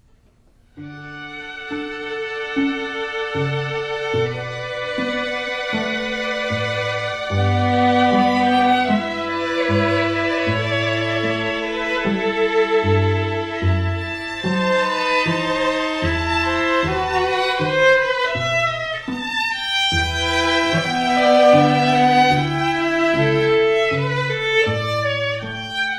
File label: air